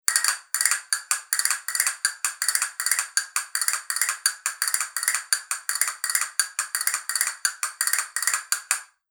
castañuelas vidrio doble caja

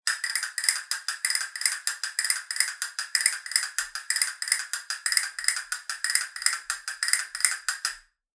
castañuelas granadillo consol

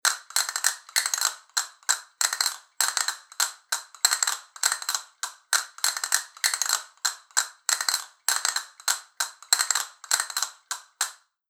castañuelas tela jota profesional

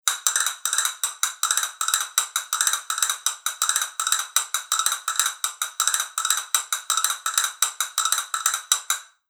castañuelas madera roja veteada

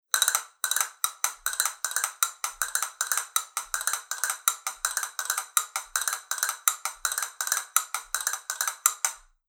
castañuelas vidrio doble caja

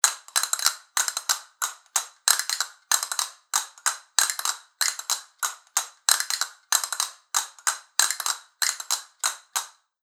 castañuelas especial jota

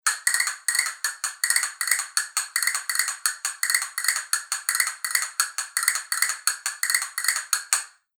castañuelas vidrio

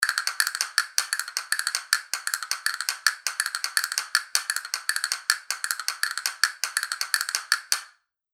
castañuelas granadillo marron

